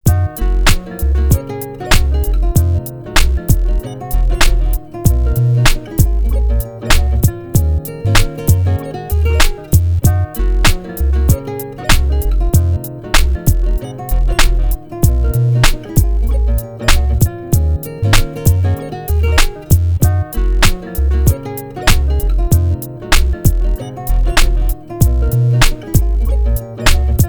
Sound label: interlude instrumental